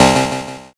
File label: zap